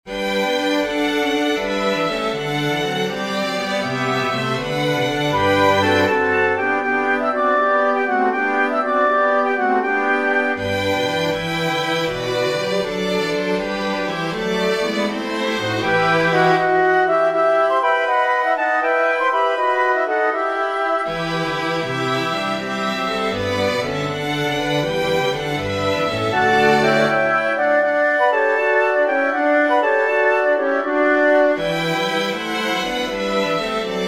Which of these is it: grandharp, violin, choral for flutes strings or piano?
choral for flutes strings